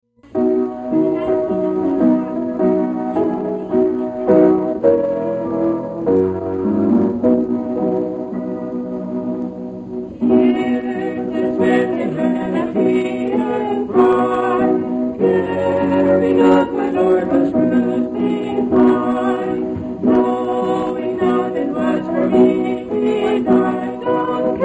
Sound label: Mixed Quartet